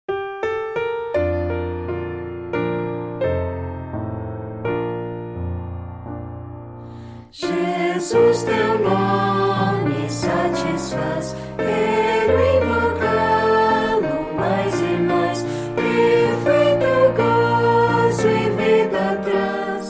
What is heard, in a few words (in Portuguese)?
voz piano